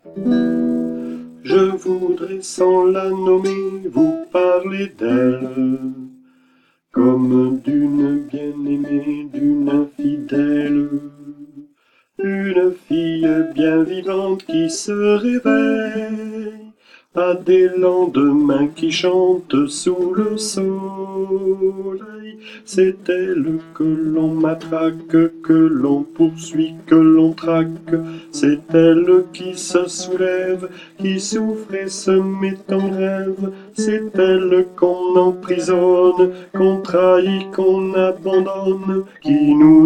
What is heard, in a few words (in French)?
alto, chorale